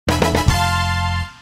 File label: Item Obtainment